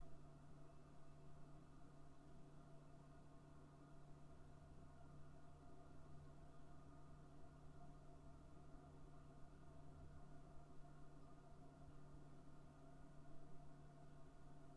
Roomtone

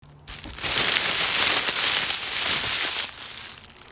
Rustle

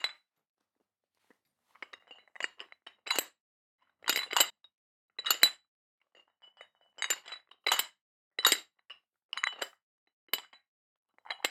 Weight Training With Iron Plate Weights

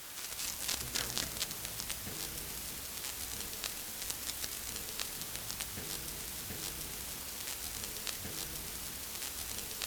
Tape Noise

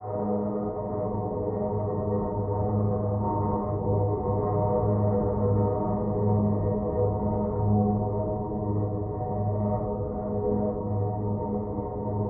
Airy Sad Drone

